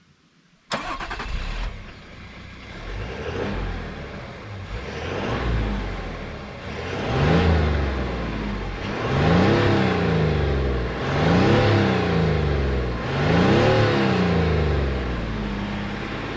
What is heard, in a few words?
toyota landcruiser 300 series